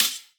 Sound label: Hat, Dubstep HiHats; Ghosthack